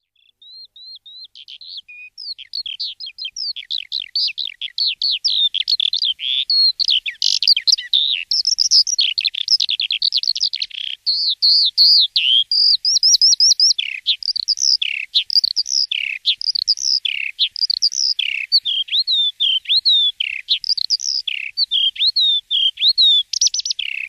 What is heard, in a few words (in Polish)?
Skowronek polny odgłosy